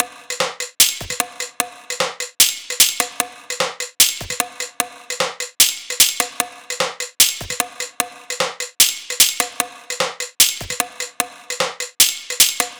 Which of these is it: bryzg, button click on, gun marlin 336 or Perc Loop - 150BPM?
Perc Loop - 150BPM